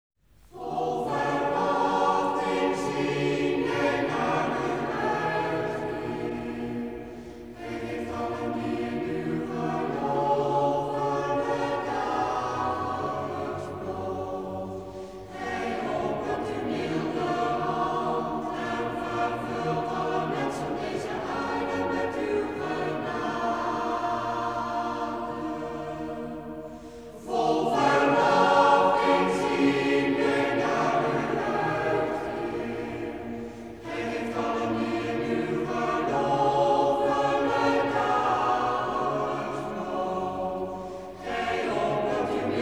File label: Cable Test Recording Analogue Choir; Kharma Test & Calibration CD; Recorded At 1974